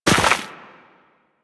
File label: Run Deep Snow, Footsteps; Warframe; Operator